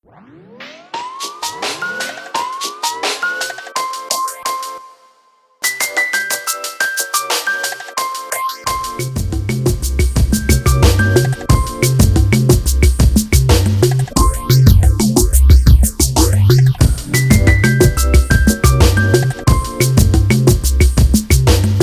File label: Bossa; JoosTVD; For Your Pleasure Vol2